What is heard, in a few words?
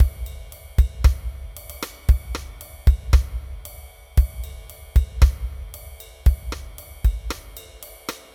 Jazzy Rock Drums